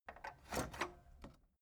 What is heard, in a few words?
tape deck open